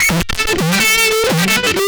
Hyperfuzz, Guitars

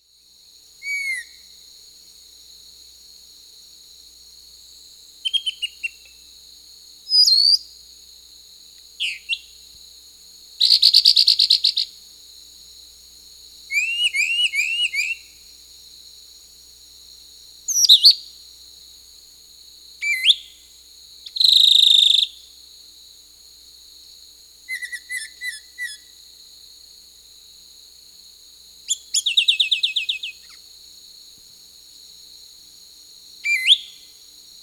Lawrence's Thrush (Turdus lawrencii), Peru; S. America

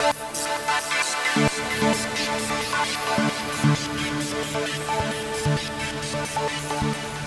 Pad; Dance

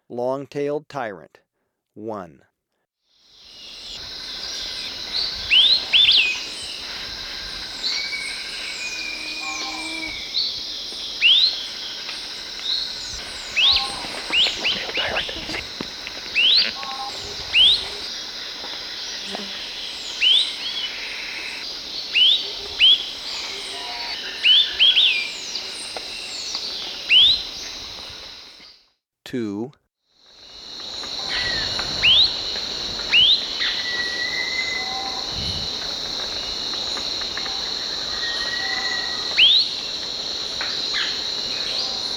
Long-tailed Tyrant; S. America

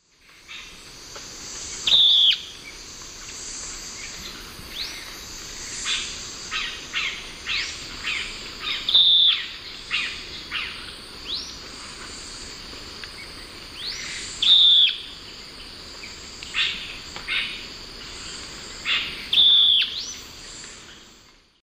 Philydor erythropterum, call; S. America; Eastern Lowlands

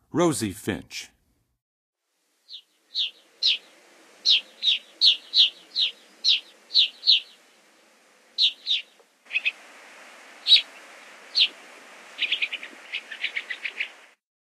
Rosy Finch; Western USA Songbirds; N. America; Songs of Birds of North America

